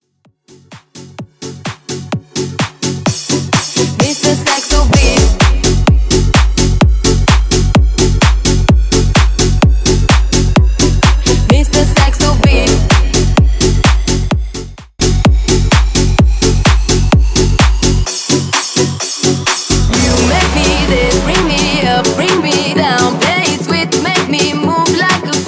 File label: Mashup Bootleg